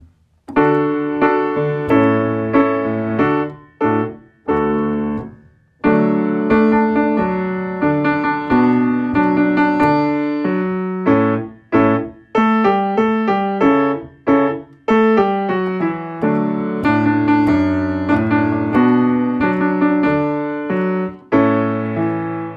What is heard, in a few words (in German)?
Klavier